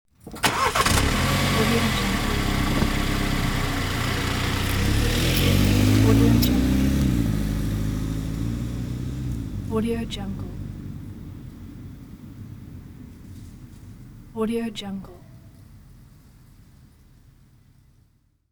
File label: car starting driving off